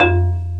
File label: MARIMBA F-1; MALLETS